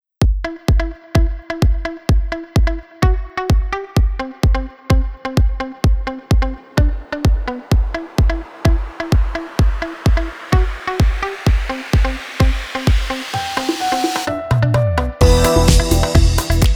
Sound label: 80 house retro; by burak sound bank; 60s 70s 80s vol 1; podyum